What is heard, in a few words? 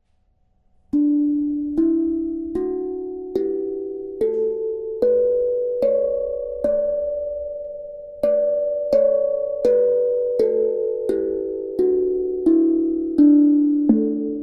D Maj